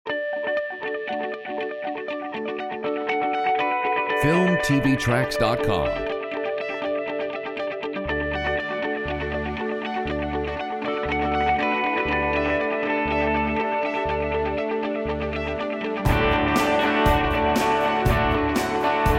Reaching for the stars, Uplifting Inspiring Royalty Free Music; Filmtv-tracks